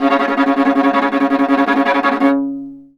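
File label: viola tremolo C#2; Orchestral; DirectWave; Varazdin Orchestral; Viola II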